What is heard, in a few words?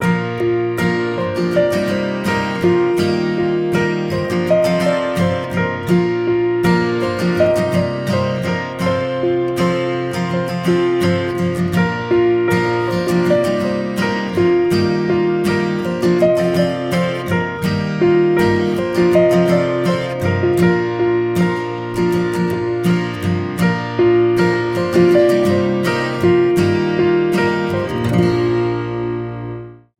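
Warm Light, guitar and piano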